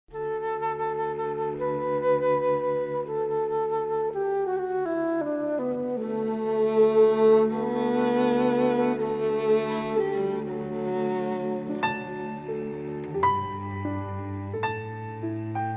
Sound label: O Sanctissima instrumental